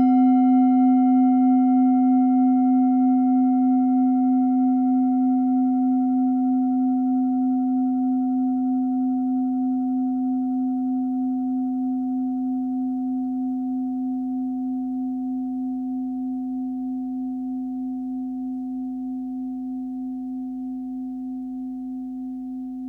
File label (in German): klangschale set; Gewicht = 730g; Durchmesser = 16,1cm; Höhe = 7,9cm